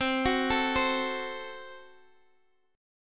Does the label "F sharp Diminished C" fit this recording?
Yes